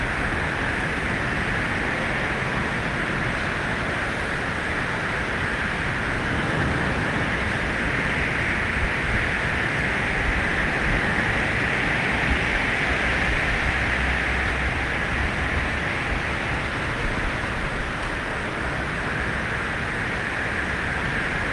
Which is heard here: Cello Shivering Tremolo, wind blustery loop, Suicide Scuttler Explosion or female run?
wind blustery loop